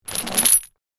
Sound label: Lifting metal chains off floor pickup